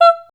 E5, Voice Spectral

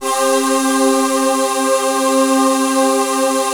VOICE PAD, ATMO PADS; Best Service; XX Large

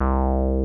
TB303

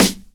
SD HARD; E-Lab; X Static Goldmine 2

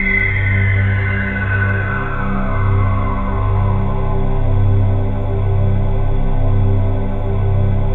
ATMOPAD; Best Service; XX Large; Pads

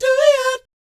DOOEEOT, Vocal Jazz Fx

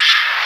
SCRAT, VNL FX; E-Lab; X Static Goldmine 2; akai

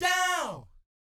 DOW F#4, Vocal Jazz Fx; Spectrasonics; Vocal Planet Vol.3 Jazz Fx

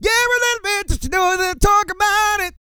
SING

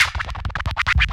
SCRAT; E-Lab; X Static Goldmine 2; akai

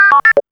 BLIPS

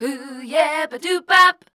Voice Spectral MELODIC C

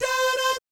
DAH DAT, Jazz

